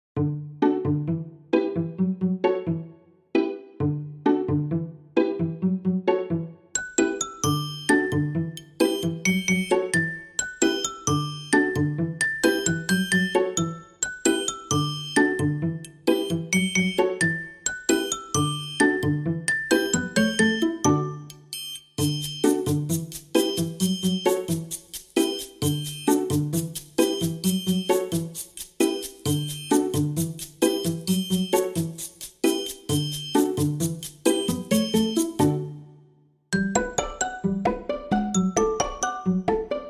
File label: bgm Music; bird-go-home